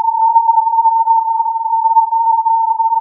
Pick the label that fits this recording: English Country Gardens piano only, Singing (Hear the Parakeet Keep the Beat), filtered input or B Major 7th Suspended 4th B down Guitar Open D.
filtered input